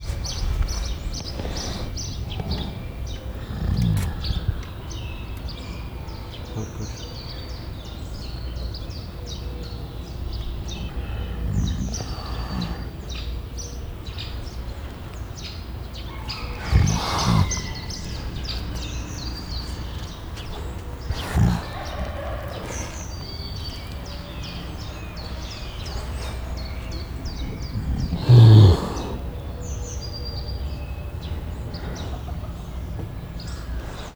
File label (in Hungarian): szuszog, feher farkas; állathangok; nyíregyházi zoo 2010